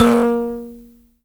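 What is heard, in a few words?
high buzz kalimba A#3; Heart of Africa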